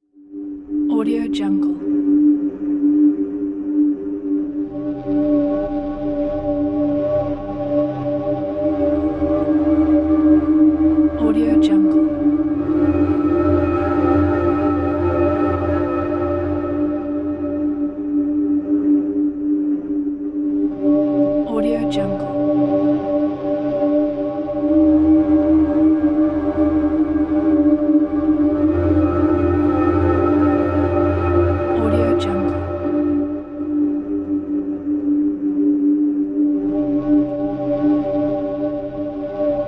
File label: suspense ambient